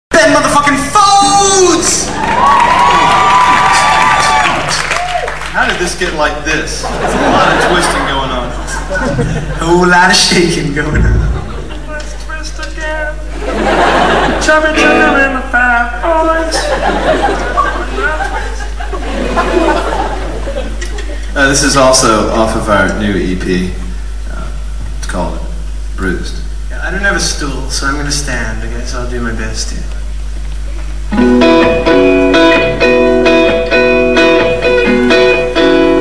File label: Bass Solo